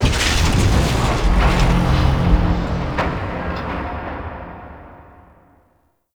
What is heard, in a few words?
Void Dragon Move Long; Warframe; Duviri; Movement